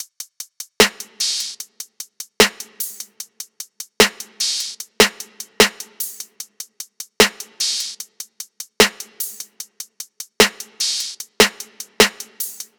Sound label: Top Drum Loop 150 BPM; Cymatics - Astro Gazing